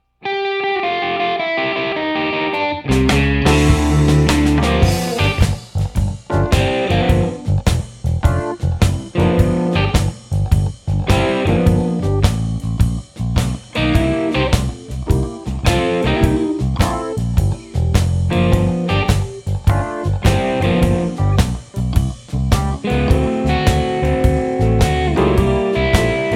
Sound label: blues